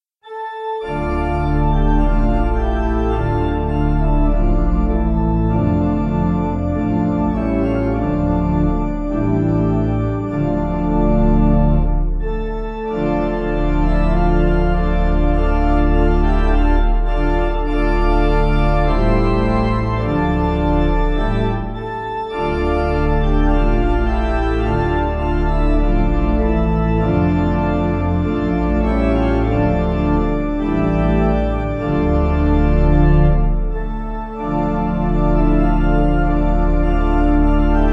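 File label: round pipe organ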